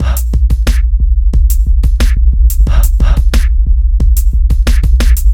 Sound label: Beats 90